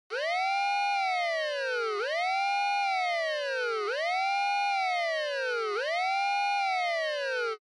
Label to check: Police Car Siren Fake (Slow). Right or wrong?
Right